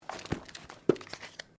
Book Page Heavy Close; Book movement; Books; Offices; SoundEffects-Foundation1

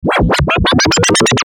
Stinger Pulse Chopper Descend; Production Element Imaging Element Accent Transition

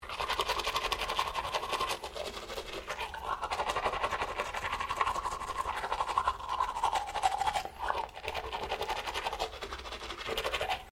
MALE BRUSH TEETH; Male Vocal sounds; Brushing (Sound Effects:Human)

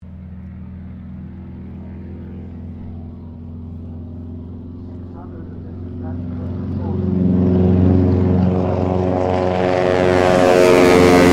AIRCRAFT AT-6D HARVARD MK III TAKEOFF CROWD NOISE; Harvard Training Aricraft; Airplanes; Vehicles

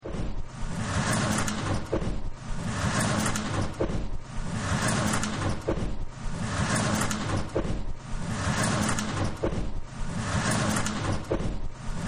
Factory; Factories; Backgrounds

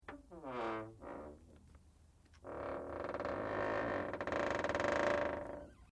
METAL CREAK LIGHT; Materials

